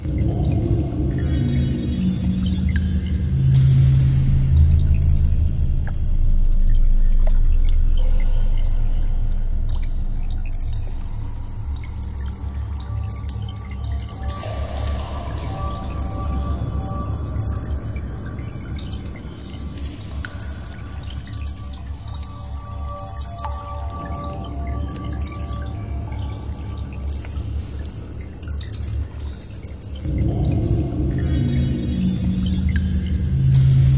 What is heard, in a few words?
Ambient Caves and Machinery; rayman 2